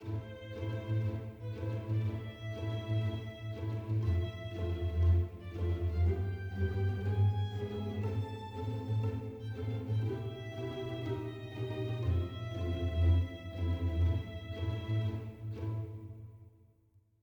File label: heroic soft